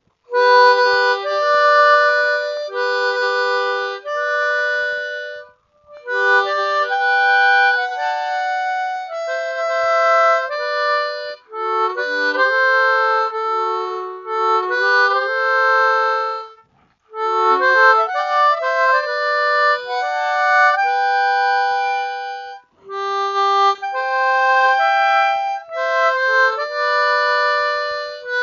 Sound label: Brahms Lullaby, harmonica; Chorus Effect by Adobe Audition 2.0; Chromatic; SCX-48 (G)